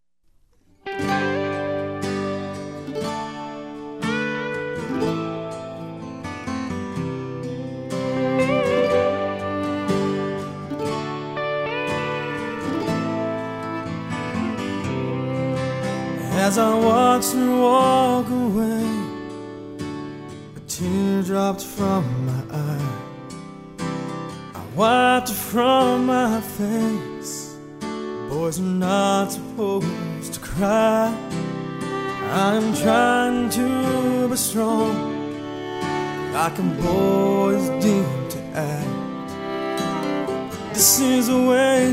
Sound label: Cry